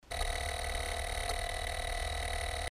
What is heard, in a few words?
Faster Clicking